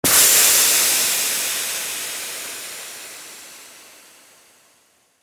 Tire Puncture, Transportation, Automobile, Car, Tire, Puncture, Cut, With, Knife, Impact, Release, Air, Fast; BlastWave